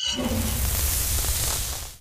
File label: oil cast fire; Minecraft; Votive Content; wows